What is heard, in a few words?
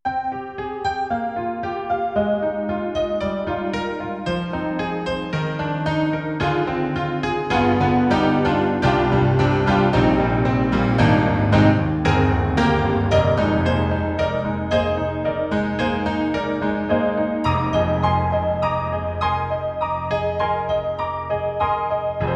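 SD-90 Solo Stereo Honky; EDIROL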